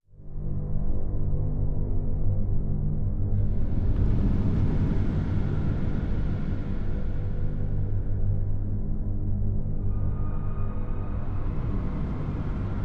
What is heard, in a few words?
Ominous Shadow Ambience